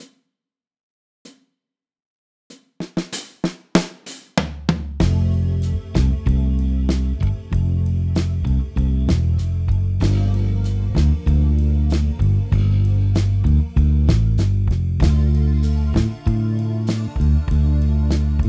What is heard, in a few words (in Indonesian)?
CHORD DRILL IRINGAN BOSSAS IMPROVE, BASS